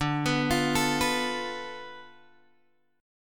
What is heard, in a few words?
D Minor 6th Guitar Open D